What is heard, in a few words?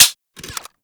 Fire Mech, rifles; Snipetron